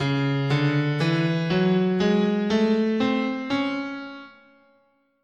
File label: C sharp Neapolitan Minor, scale